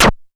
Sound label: ZZAP; Q Up Arts; Dream Experience; DRUMS PERC